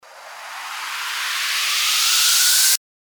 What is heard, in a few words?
RISER